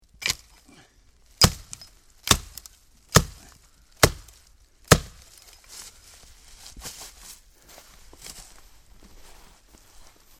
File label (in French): percer la glace